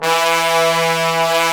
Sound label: BRS TBNS, Bone Sec ff; Roland L-CD702; Bone Sec.FX